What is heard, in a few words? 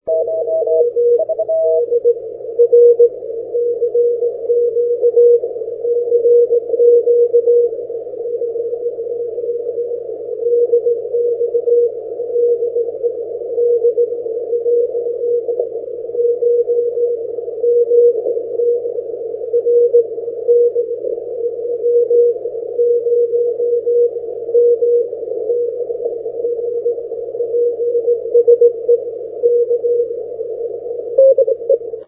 80m cw, Mike from Germany was booming in on CW this evening; DJ0MDR; FT-1000d